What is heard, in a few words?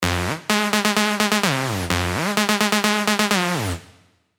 Stage Crasher, Sylenth1 dirty dutch leads; revealed sylenth1 dirty dutch leads vol 1